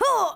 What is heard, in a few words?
Hunter damage